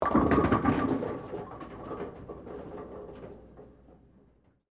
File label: Bowling Pins, Knock, Over, Bowler, Perspective; Sports; Bowling Sound Effects; Blast Wave